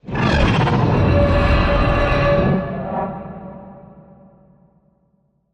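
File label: reverse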